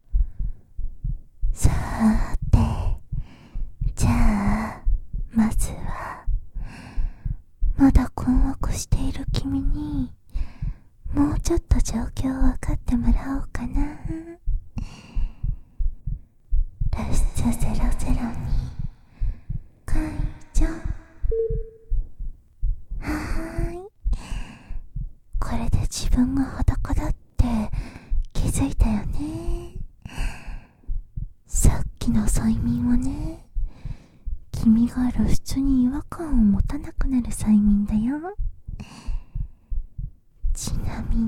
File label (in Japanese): 耳舐め, ASMR; 耳舐めで連続ドライ絶頂からの寸止め手コキと強制連続射精